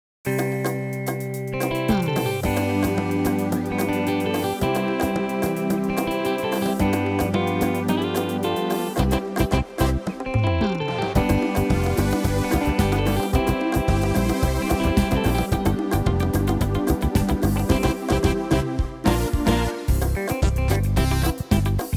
ZOUK

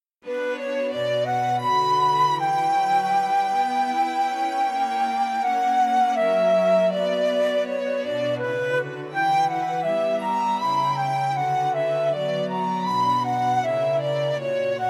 Menuet; Bach suite 2

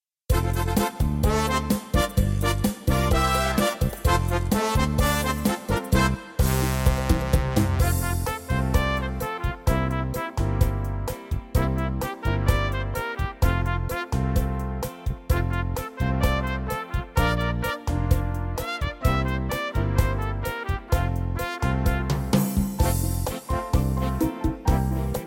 Chacha

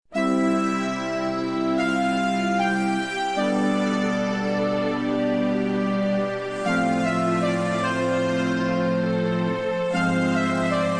Karaoke Playback Instrumental

